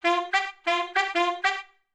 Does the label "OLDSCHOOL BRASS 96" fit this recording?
Yes